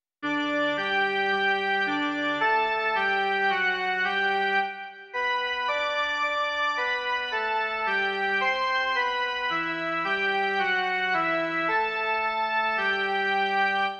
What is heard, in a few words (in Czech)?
jen melodie; Hospodine hodný chvály